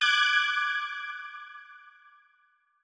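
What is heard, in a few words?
Goddess Sceptre Light; Godess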